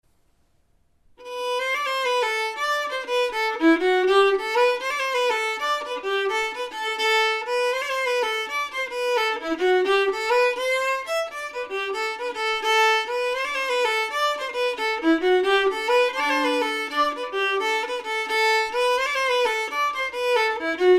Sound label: fiddle; Kilkenny Jig